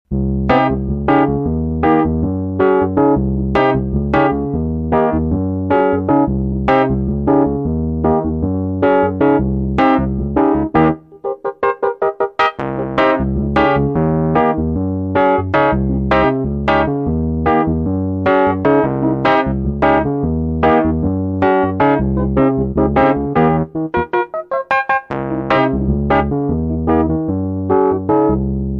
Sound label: Stage Jazz